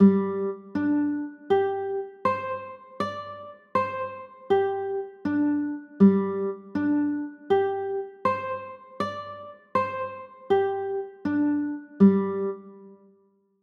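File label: G sus4 chord, guitar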